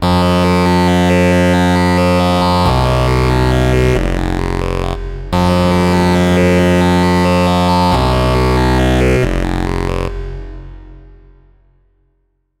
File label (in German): Korg KROSS 2 Eigene Samples mit FX